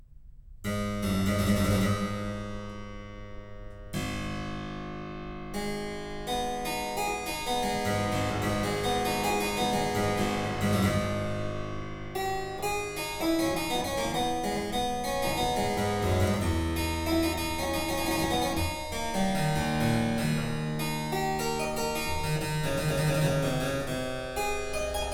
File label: Suite No.4 In A Minor, Prelude, Harpsichord; Purcell edition